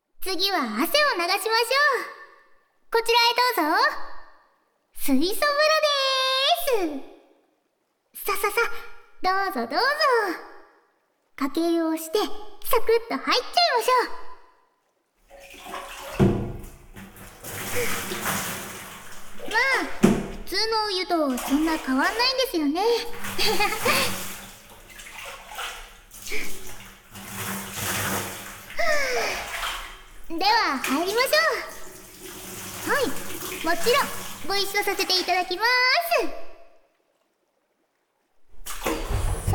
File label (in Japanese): ジャグジーでリラックス ～ジャグジー混浴ASMR～